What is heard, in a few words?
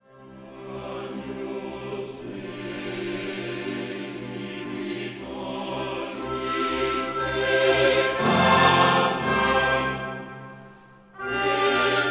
Agnus Dei Agnus Boring Tune